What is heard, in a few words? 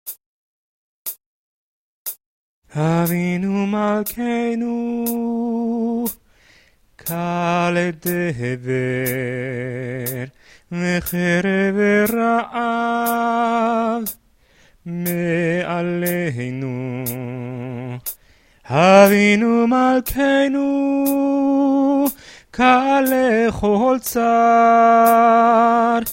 avinu malkenu, choir